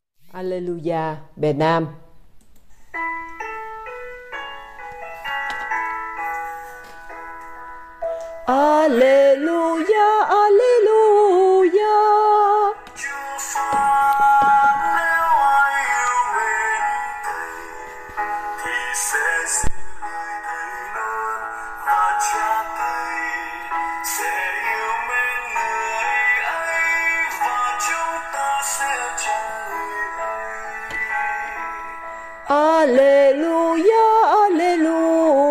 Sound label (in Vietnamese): Alleluja nam